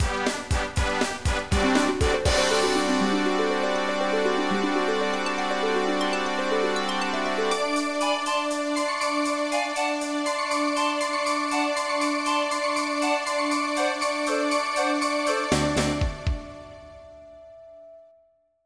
MIDI PROMPT

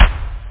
deep kick; OctaMED SoundStudio V1; jazzies samples; perc

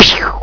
pschiou; Bachman 1.1.2u

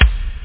kick; kicks

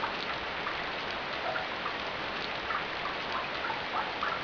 new rain

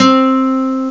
ac. guitar

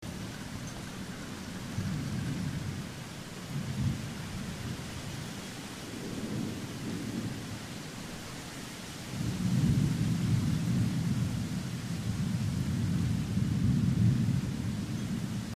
Rain Forest; 1944 Battle Of The Bulge

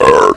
BURP; Kids' CD-ROM Bible Fun Pack; Education Publishing Concepts; NOAHDEMO

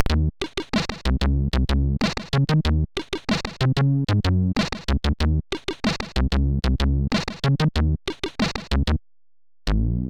Funk, SIDTunes; Worktunes

